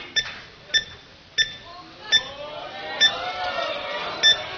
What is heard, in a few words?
cash wheel; thenetherlands